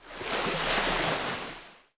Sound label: ocean; 1997 MMX LAN PACK (win 95); Age of empires